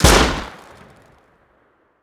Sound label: pistol attack; Rust; eoka